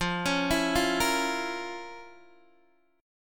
F Major 7th Suspended 4th Sharp 5th F, Guitar DADGAD